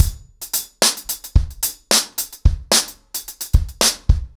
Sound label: 110BPM, acoustic drums, drumloops